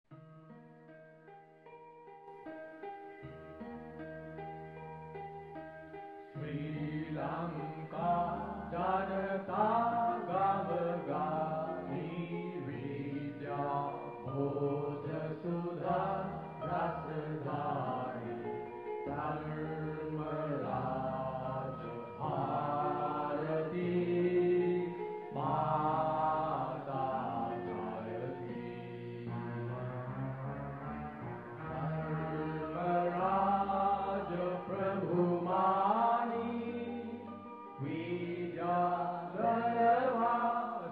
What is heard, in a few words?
Vocals, College Song